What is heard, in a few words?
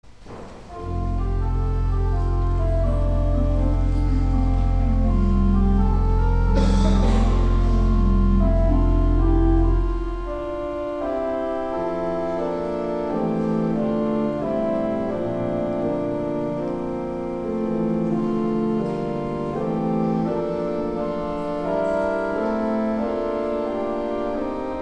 Organ, Chorale Prelude; St. John's Cathedral, Jacksonville Florida; Paul Manz; 'Comfort, Comfort Ye My People'